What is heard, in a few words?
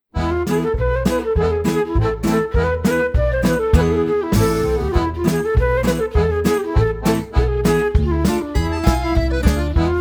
Freylekh Flute